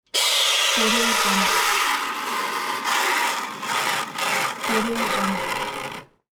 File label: espresso machine foaming steam